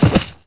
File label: thud; REDNECK; RAMPAGER